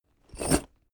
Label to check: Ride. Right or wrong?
Wrong